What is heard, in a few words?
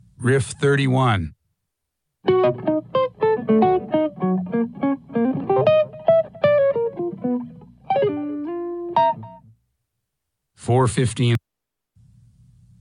Riff